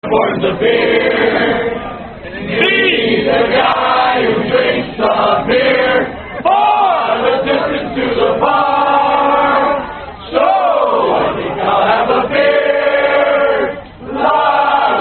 Drinking Song, Parody Drinking Song Sung By Fire Fighters